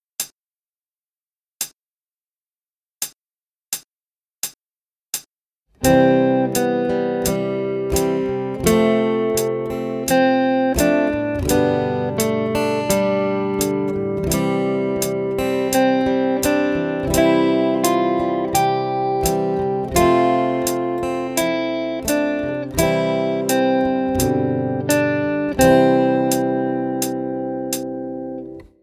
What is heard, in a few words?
3 Strings fast, guitar